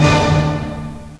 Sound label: orch; Urban Shakedown